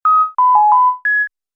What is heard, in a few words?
Multimedia Music